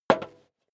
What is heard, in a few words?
step leather shoes metal dry single right; schritte